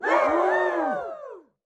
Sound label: audience cheers